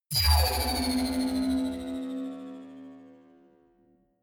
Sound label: energy field vanish